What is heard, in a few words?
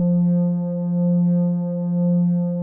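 F-2 PR6 ORGAN, SYNTH VIBRA; AKAI S-Series CD-ROM Sound Library VOL-7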